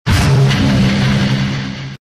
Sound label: tank horn; mvm